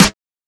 Crispy Acoustic Snare Sample G sharp Key, Royality free snare tuned to the G# note. Loudest frequency: 2611Hz; modern snare drum